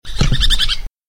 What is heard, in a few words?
checkered woodpecker